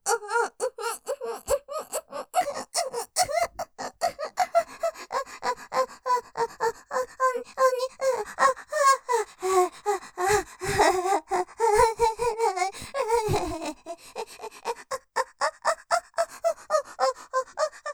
絶頂寸前 トロ顔で声がふわふわしながら喘いじゃう, ASMR; ステレオ; ループで楽しむ！シェフの気まぐれ抜き抜きコース

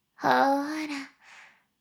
しこしこ音声; 少尉; 牧場戦腺

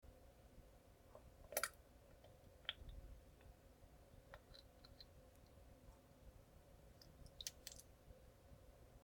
ぷぶ ぷ ぶぷぃ, ふぇち音 下痢の音

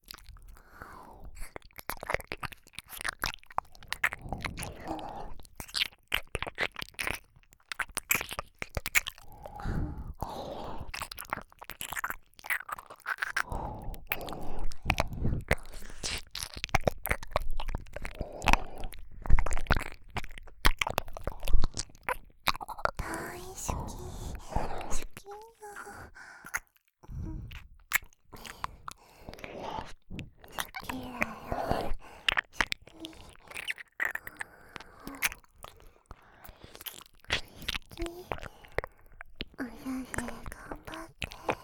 両側耳舐め; 童貞大好きアイドルたちに射精を応援されるお話♪

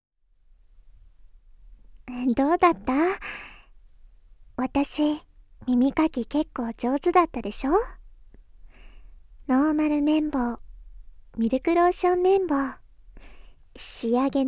舌筋を感じる舌圧耳舐め

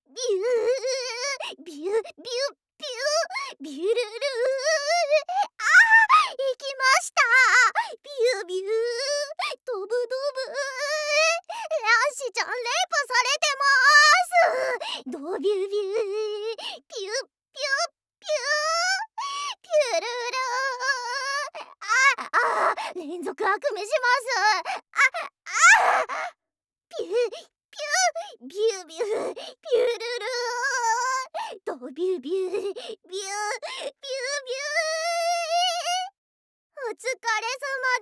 びゅ～～♥びゅっびゅっびゅっ♥

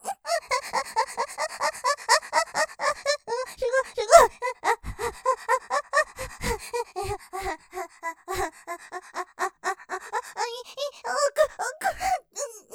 喘ぎ とろとろ感; 奥！おく、いいぃ；；; ステレオ

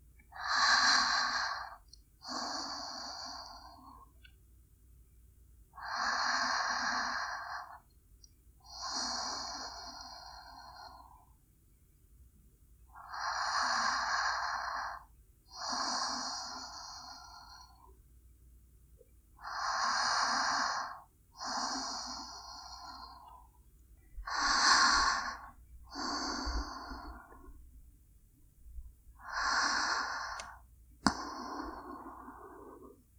寝息（右）

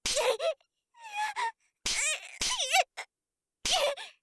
いたっ

